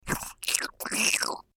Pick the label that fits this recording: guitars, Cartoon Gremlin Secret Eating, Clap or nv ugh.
Cartoon Gremlin Secret Eating